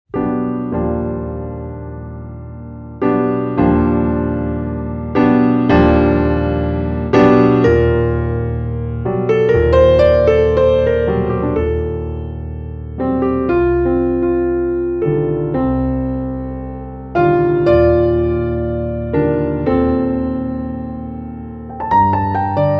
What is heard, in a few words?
little tune